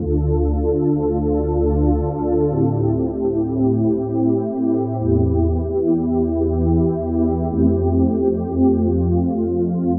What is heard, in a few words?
Ocean Pad, Pads; Funk; Sonic Foundry; Dr. Fink's Funk Factory